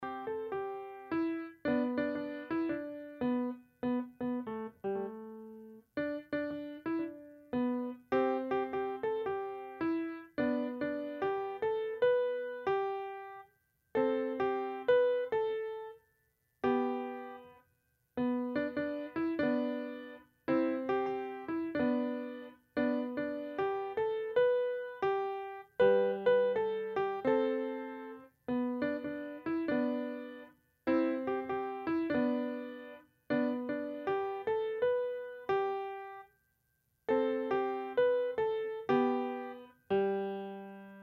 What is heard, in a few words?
Instrumental; calvaryministries